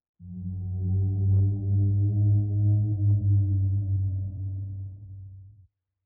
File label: moan; STALZONE; minecraft